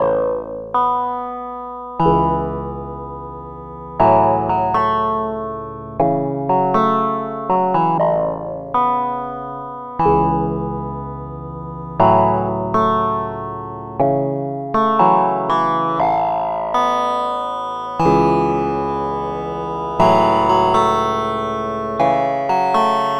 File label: Lead Melody, Chillout; Sonic Foundry (Sony Creative Software); Afterhours EDM; Chillout Service